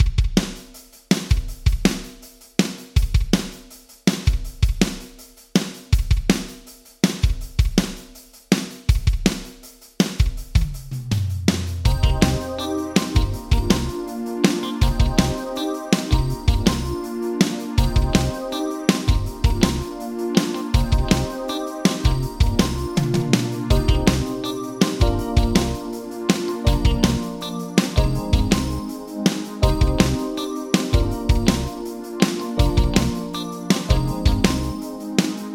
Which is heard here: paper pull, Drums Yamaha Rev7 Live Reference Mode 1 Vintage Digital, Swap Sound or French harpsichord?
Drums Yamaha Rev7 Live Reference Mode 1 Vintage Digital